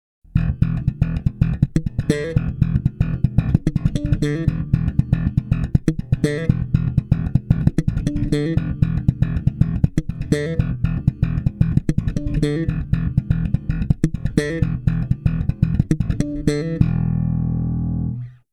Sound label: JB neck bridge